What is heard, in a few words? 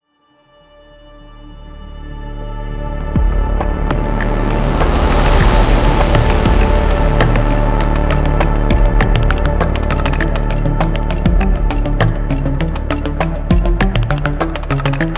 Rhythmic, Active